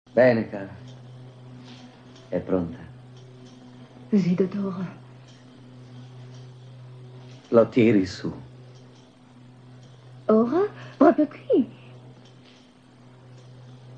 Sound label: piano